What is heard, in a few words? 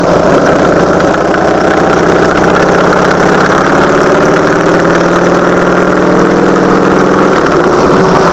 Harley Davidson